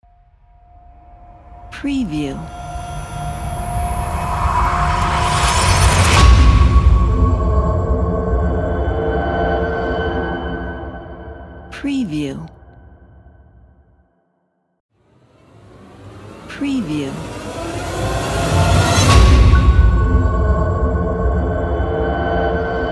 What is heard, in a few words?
impact logo